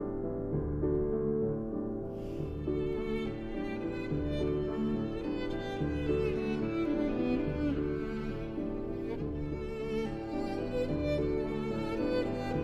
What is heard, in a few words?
Violin and Piano in D